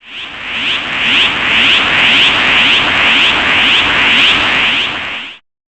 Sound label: charge